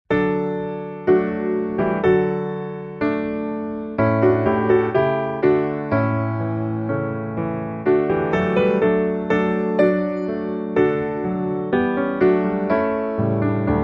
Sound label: piano